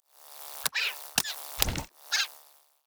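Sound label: dragon idle; lulu dragon trainer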